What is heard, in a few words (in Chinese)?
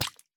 蝌蚪受伤声